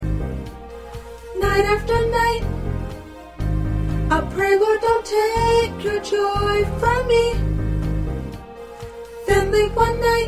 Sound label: Soprano, choir